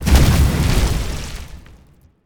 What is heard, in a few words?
Earth Spell Impact; AudioWizards